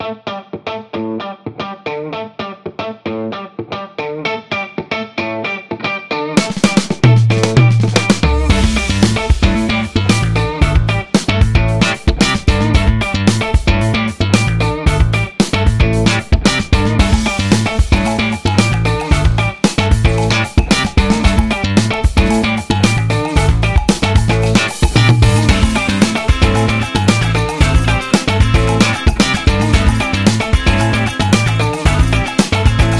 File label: Funky Beat; casino